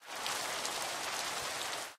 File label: rain, ambient weather; rain2; minecraft; resourcepack